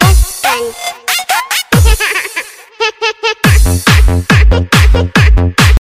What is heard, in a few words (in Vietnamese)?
tieng tre con cuoi remix tiktok nhac thai lan